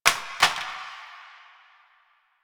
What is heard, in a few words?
Plastic Drop; Warframe; NineteenNinetyNine